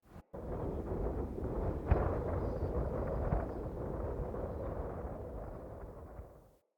Fort Wind Gust Low Mid Howl; Ambience

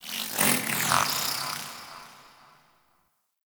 Sentient Lair Exterior Creature; Warframe; Ambience; Misc Creatures

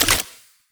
Shotgun Empty Reload; Pax Duviricus

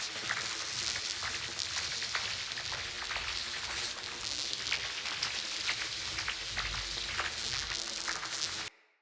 Shield Loop

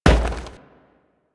Crewman Footstep Walk; Warframe; Corpus

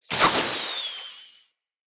Bow Arrow By Charged; Weapons; Josalfar Bow

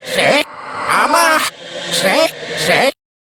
Bird; Warframe; Entrati Lab Quest; Full Quest

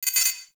Rail Jack Tech One Off

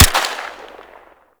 Shot, weapons; Warframe; Tenno; Dex Furis

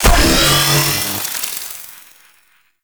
Sniper Charge Up; Weapons; Warframe; Sentient; Caliban Prime Sniper

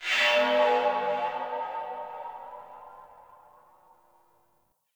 Tech Flow Texture Med, Corpus Ship ambience; Textures

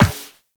Operator Walk Metal Perforated, Footsteps; Warframe

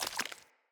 Walk Water, Footsteps; Characters; Enemy; Mockeries; Mockery

